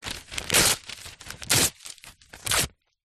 household telephone directory yellow pages page rip small pieces